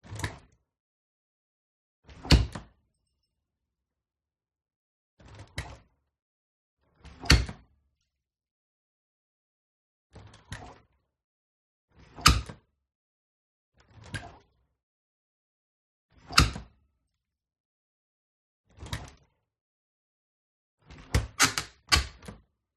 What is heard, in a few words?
WINDOW Kitchen Plastic Handle Open and Close Hard Close Perspective; gain walkers